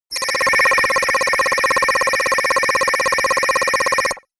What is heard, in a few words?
notification data transfer aggressive